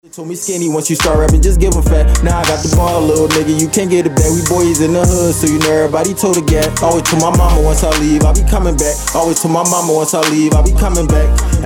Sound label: HIP HOP